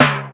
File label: clean tom single hit c sharp key